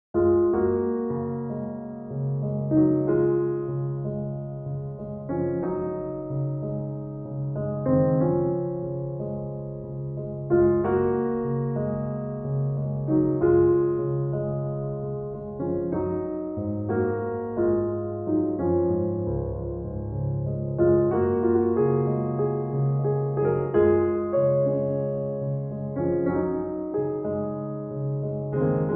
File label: Solo Piano